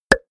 pop

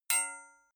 knife hit metal ring deep

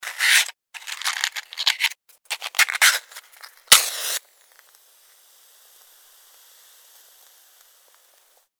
light a match

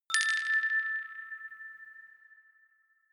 notification glockenspiel rattle fourth

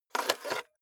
cassette out of cassette player soft

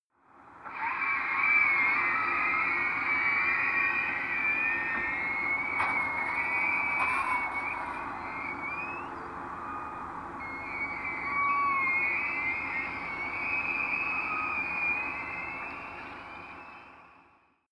harbour

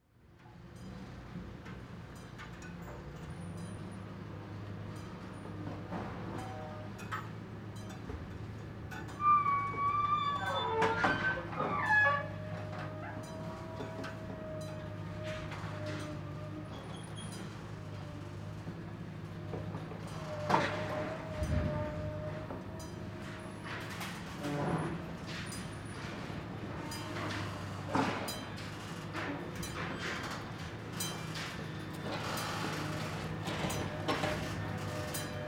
scrap yard lifting crane

